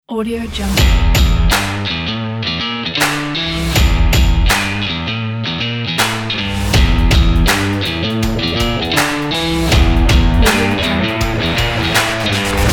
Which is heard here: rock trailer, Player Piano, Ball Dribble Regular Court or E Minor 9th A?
rock trailer